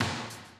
drum loop, 100 BPM

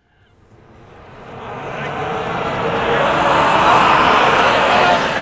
LAUGH REV

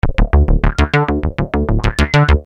Syn Bass 100 D, Techno Synth Loops; Sonic Foundry (Sony Creative Software); Signals I

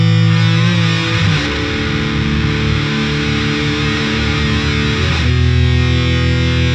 SPACE GTR; Zero G - Funk Guitar

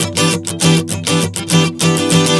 Strum 100 Em, One Chord Strumming 100 bpm

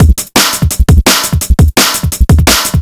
Lies Break 170, breakcore breaks

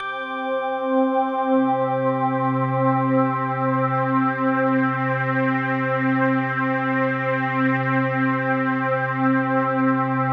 SYNTH, C3; CARLOS; AMG; Global Trance Mission VOL-2

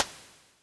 Ana Sh Rim, Electric Snare, Yamaha DTXpress

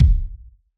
hip hop kit kick

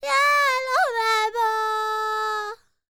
Vocal Planet World Voices, NOMADIC

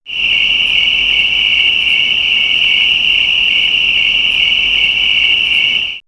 Nature, Nighttime; X-Static Goldmine

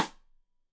Side Stick Stereo; Deeply Sampled Drums; SMDrum

